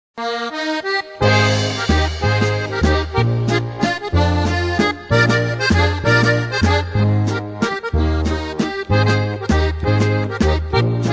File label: waltz